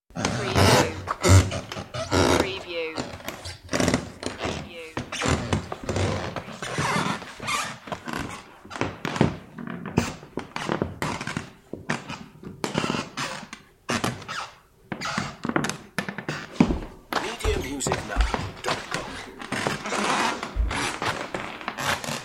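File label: Footsteps Creaky Floor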